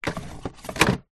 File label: household plastic utility unit drawer slide open then close fast